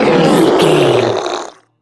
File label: troll monster death